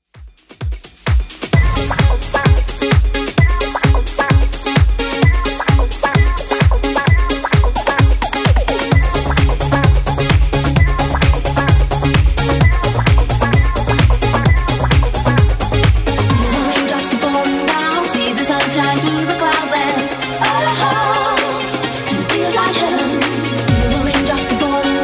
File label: 90s, 130bpm